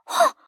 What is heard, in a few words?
Grunt pain; overwatch